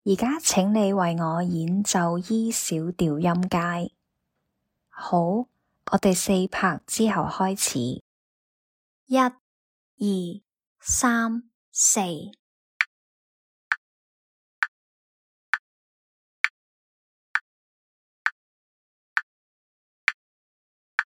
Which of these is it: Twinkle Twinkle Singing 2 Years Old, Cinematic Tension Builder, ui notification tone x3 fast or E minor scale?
E minor scale